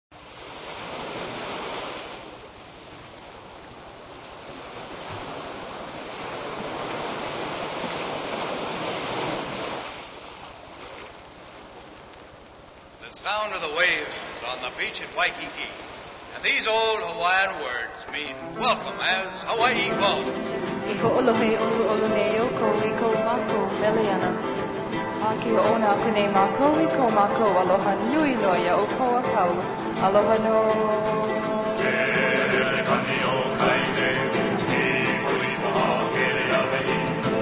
chant of hawaiian greeting; webley edwards; hawaii calls show

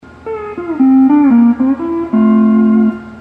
hawaiian piece

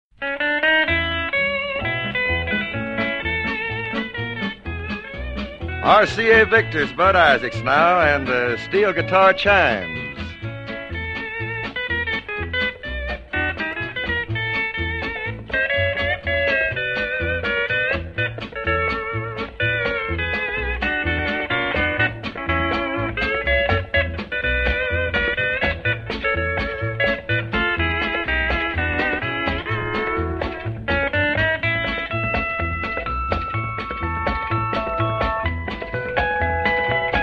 Steel Guitar Chimes

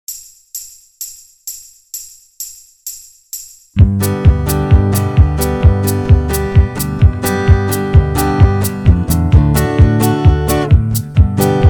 fireball mail real bass key of g normal

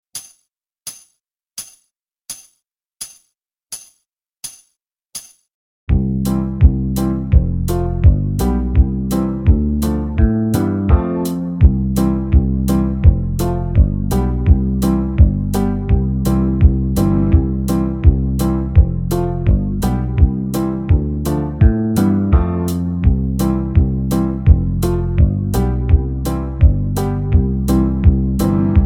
key of c normal, banjo; backing track; boys of bluehill

